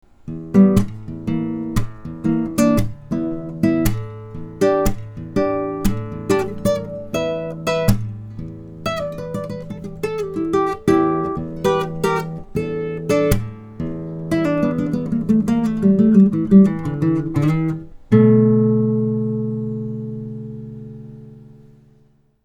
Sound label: guitar blues sample song